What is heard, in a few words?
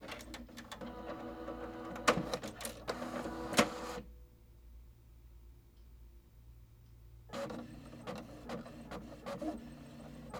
Printer Laser Sequence; Home & Office; 1001 Sound Effects